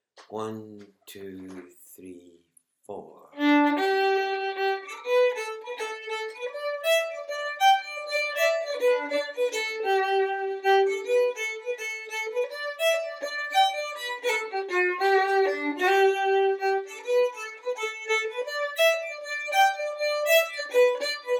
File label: Kesh Jig; slow jam